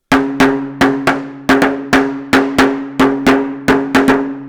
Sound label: Water Can; pitchshifter PSI